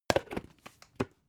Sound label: plastic bowl close